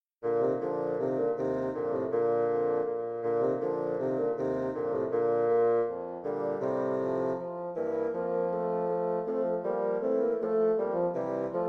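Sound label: marche bassoon trio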